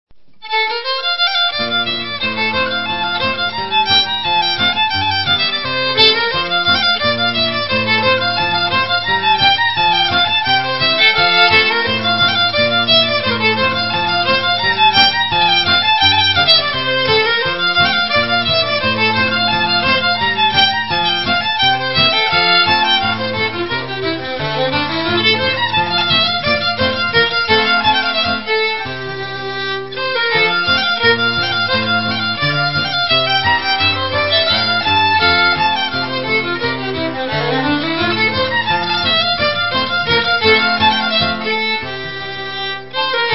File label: Hornpipe